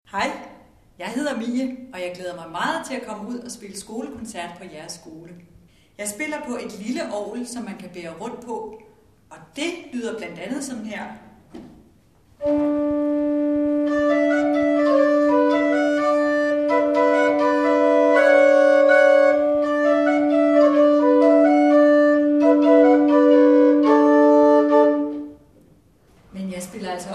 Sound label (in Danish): portativ; mie